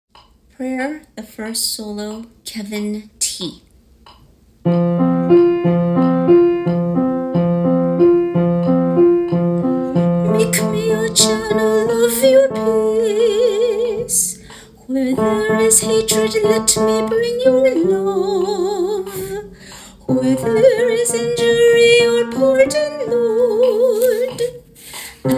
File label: Prayer Solo; Broadway Shabbat